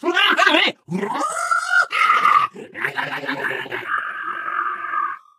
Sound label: ballbot jump